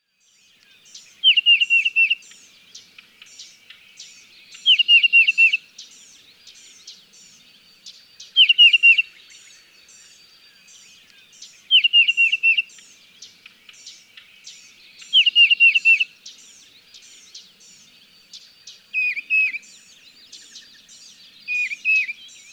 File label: Tufted Titmouse